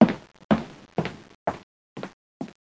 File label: walk; ProOne: Netcracker Netscape Navigator